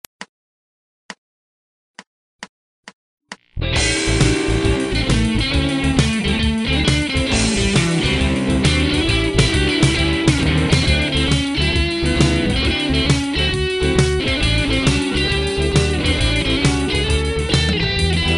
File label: pegada blues, guitar